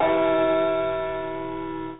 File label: tower clock, bells